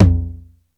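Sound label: 909 LTOM, low tom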